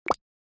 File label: knock; Candy 3D HTML5